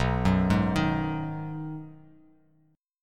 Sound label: B 6th B down, chord; arpeggiated